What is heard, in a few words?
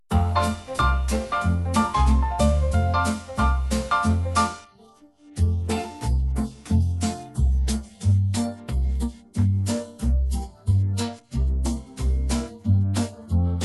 Instrumental, Podkład muzyczny; Bùrczk; autor:inteligencja Sztuczna; piosenki dla dzieci